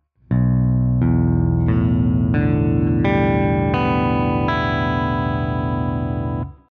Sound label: guitar 7 Reg